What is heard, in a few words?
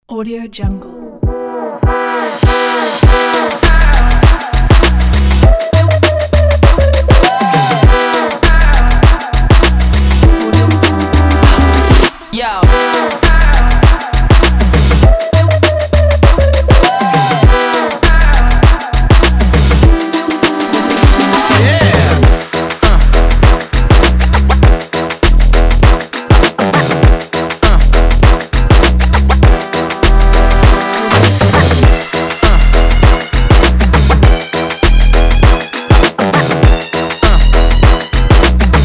hype app promo